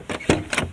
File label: door op; Mafie 1